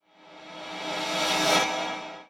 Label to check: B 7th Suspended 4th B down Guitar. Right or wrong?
Wrong